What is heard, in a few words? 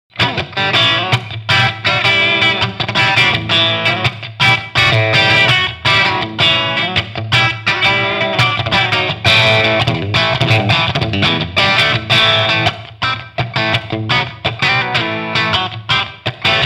Bridge PU Crunch